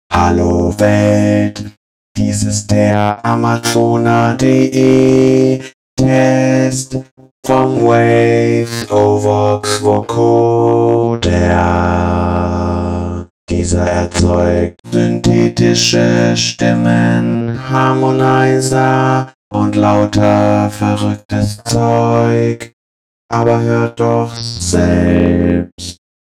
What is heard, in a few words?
Waves Ovox